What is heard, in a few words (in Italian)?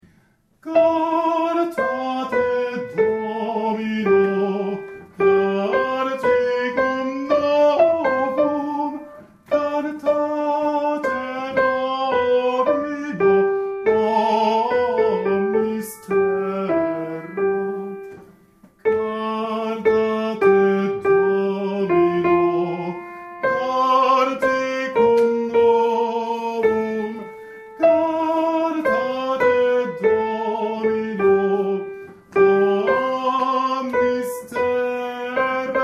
Soprani; Cantate Domino